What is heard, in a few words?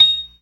PERC, ORGAN; Keith Emerson Signature Series